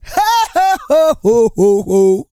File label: REGGAE, Vocal Planet World Voices; ILIO; Vocal Planet VOL-5